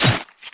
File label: MOB BATTLE SWORD, Battle Mob Weapon